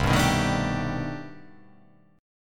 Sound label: C Minor Major 13th, chord